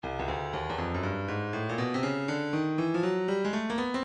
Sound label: breakbeat 073 bpm; Blue Note C; Stylus Breakbeat Midi Files